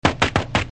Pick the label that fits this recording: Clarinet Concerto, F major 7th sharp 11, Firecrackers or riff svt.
Firecrackers